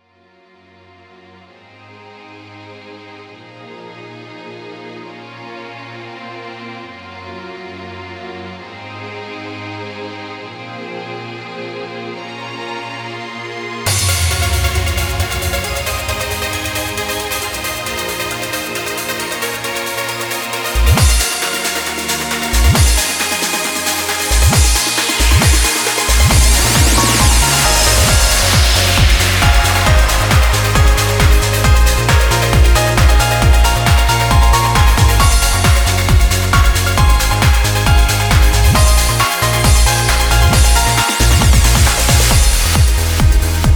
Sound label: without vocal; i.o.sound